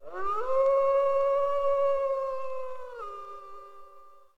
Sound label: Wolf Howl; Zomboid 41.78.16; Project Zomboid